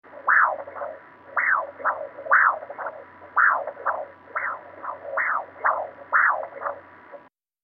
Sonogram; Interior Human Cavity Heart Pulses, Static Various; heartbeats